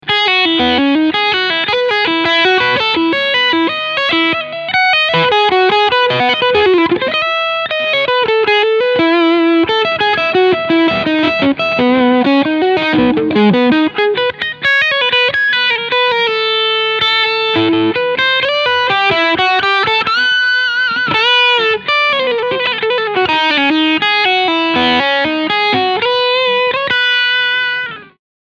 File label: FGN Overdrive Singlecoil; Boundary Iliad RHS